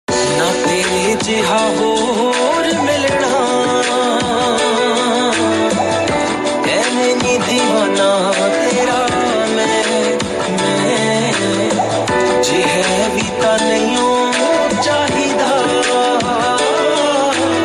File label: new romantic ringtone